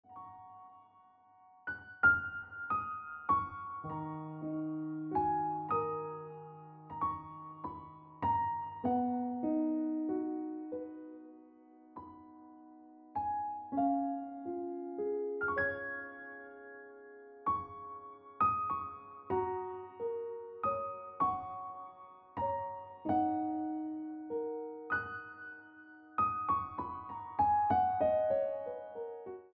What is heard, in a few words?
Ringtone Piano Music